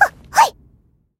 match start

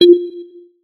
check off

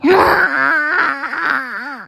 combo break; fukari alt

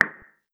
click short confirm

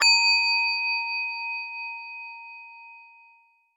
section fail; tranquil sublimity

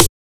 soft hit clap; 『Chip 1.1』; Hitsounds

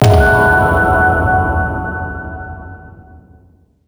card move; penguin

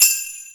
soft hit whistle; Azer+ 2023; defaultish

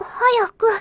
match confirm

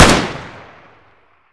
normal hit normal; troll CSGO SKIN

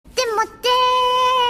combo burst; Konata

